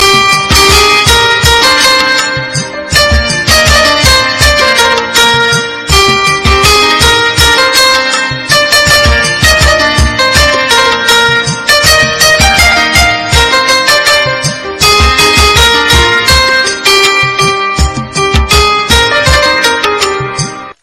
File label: Love Ring Tone